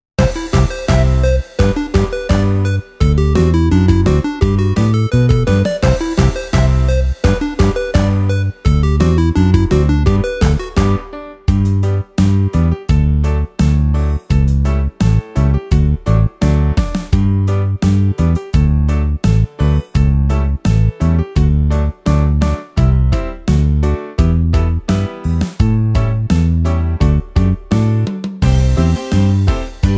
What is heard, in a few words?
Karaoke